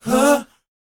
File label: BOYZ A, Vocal Planet R&B & Dance; ILIO